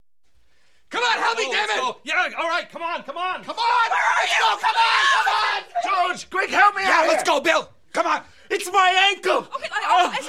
Crowd Panic Walla; Blind Drive

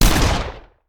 Shotgun; Sound Ideas; Guns

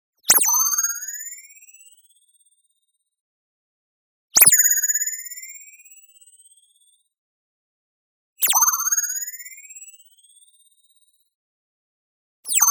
BLING Ping Pitch Rise; boom library; Anime Essentials

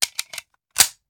Machine Gun Foley; Sound Ideas; Guns

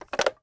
Telephone Rotary Receiver Up; Communications; 1001 Sound Effects

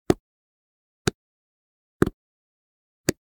Generic Button Wood Medium

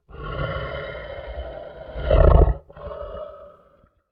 Creature Breath, Horror Effects

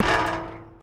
Street Ball Metal Rim, Street Basketball; SFX Kit

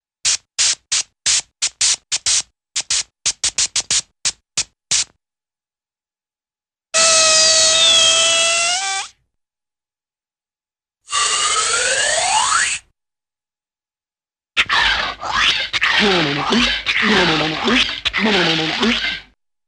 Various Quick Musical Air Blasts; Cartoon; Hollywood Edge; Cartoon Trax

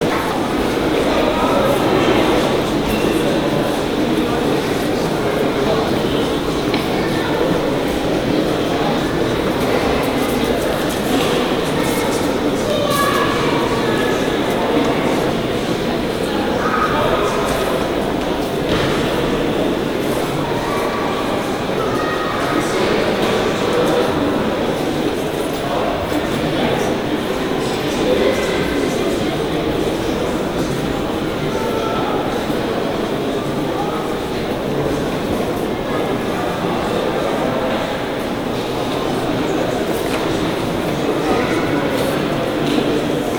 crowd museum; best service Blue Box; crowds interior

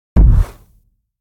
Footstep From Monster Dinosaur

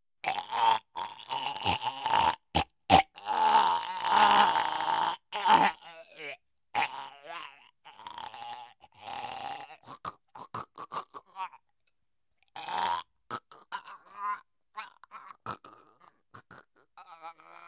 Strangled Man, Scary Sounds; 1001 Sound Effects; Halloween Horror Sounds Effects

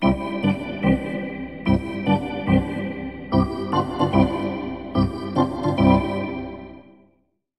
Hockey Organ

